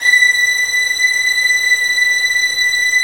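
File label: violin section, Arco Violins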